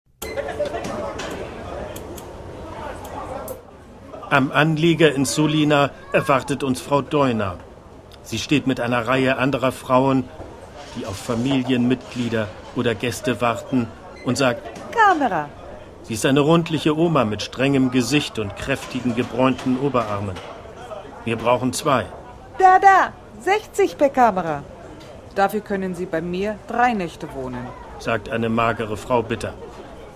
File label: taxi water real